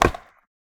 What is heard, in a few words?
skateboard land hard; smite; osiris